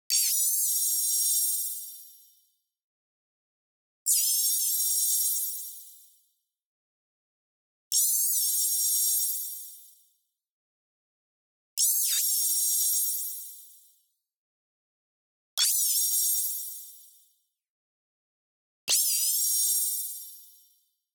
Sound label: tonal, static tone blip; boom library; Anime Essentials